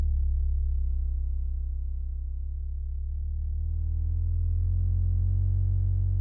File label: soul tone